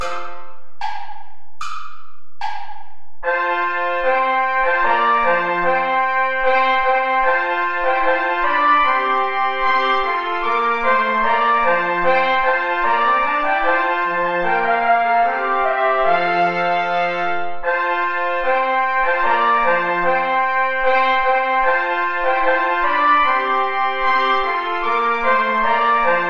play along without trumpet; friderici daniel; wir lieben sehr herzen

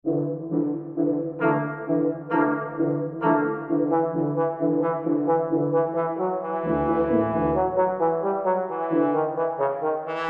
out doors with drums and pipes

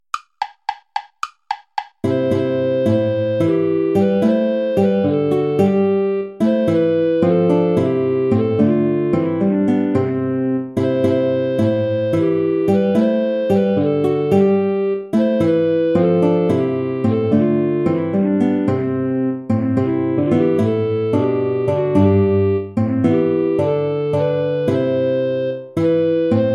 play along without guitar; hassler hans johann leo; ein feste burg ist unser gott

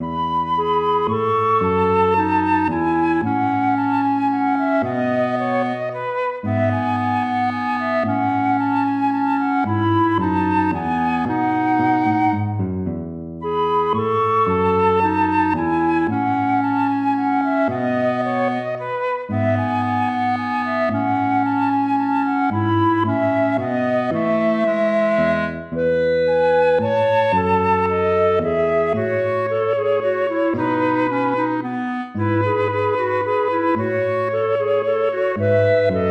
minuet major

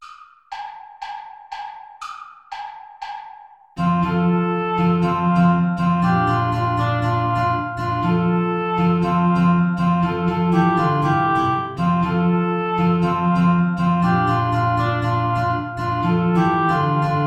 play along without guitar; traditional

